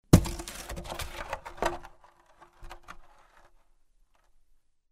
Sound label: Wooden barrel falls to the ground